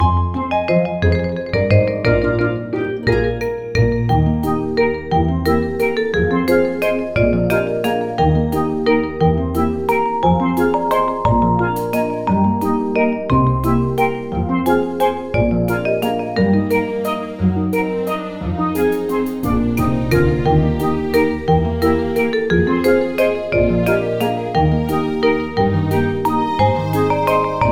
Underwater (Idle); Super Mario Bros. Wonder

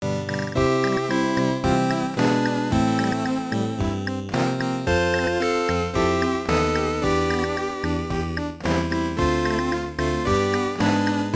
Happy Birthday!; tokimeki memorial; turbografx 16

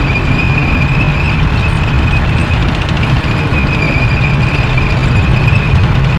Vehicle Tank - Run

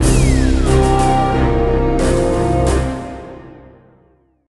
you lose; Worms Armageddon

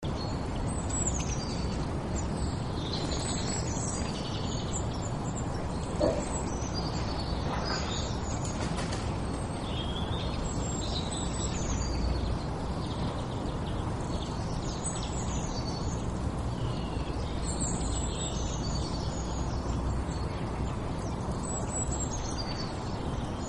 ambience track village; dragon fin soup

